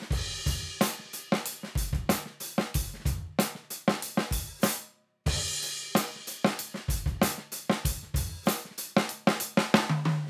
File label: Loop dry; neuro; Live Loops